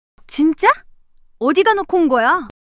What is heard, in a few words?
surprise